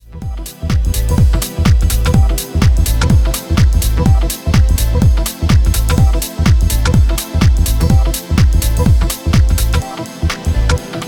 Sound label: Money Blues (Instrumental)